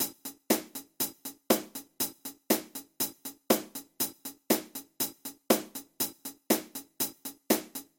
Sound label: snare hi